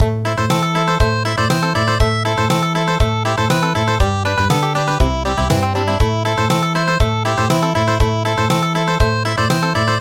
Country

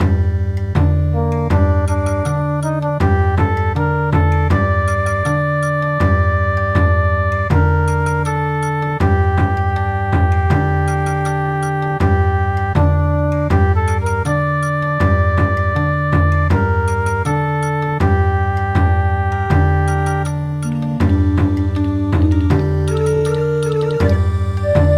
Bon Odori; The Nanbu Cow Driving Song

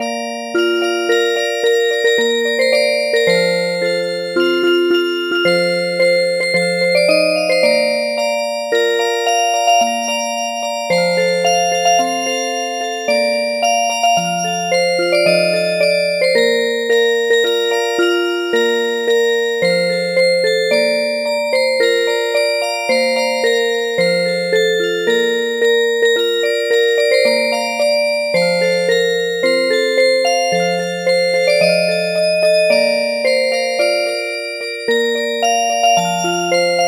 Music Box

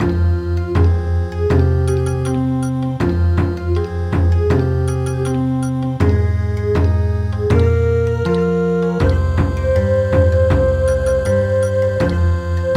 Bon Odori; Armenian lullaby

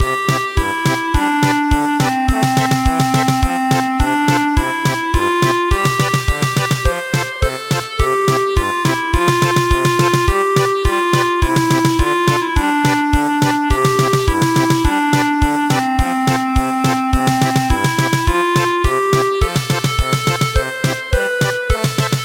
German Polka

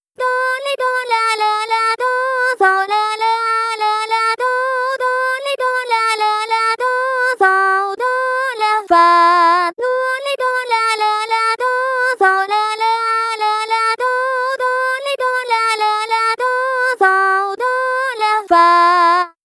Doremi; midi traditional vocal; London Bridge

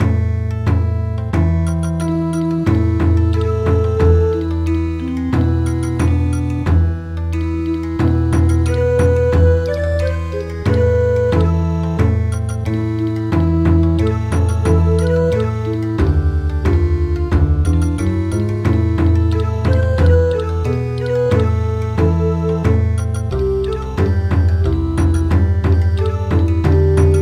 Bon Odori; Wishing you a Merry Christmas

